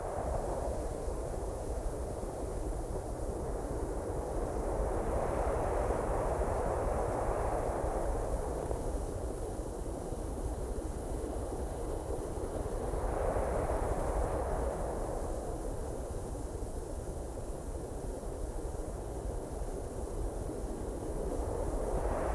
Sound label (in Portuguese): Vento na noite